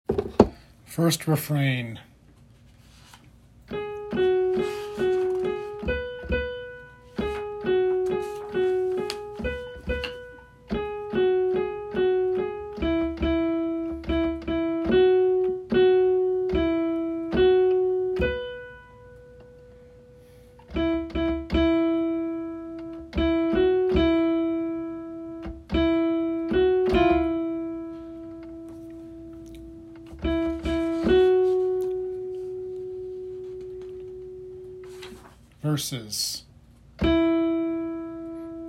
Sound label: alto piano